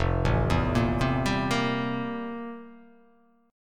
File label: F Major 11th, chord; arpeggiated; JGuitar